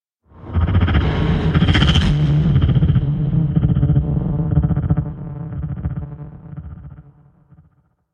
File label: Epic Sci Fi Whoosh; Sound Effects Club